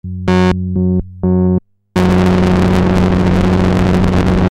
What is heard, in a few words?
sh101 lfo noise; roland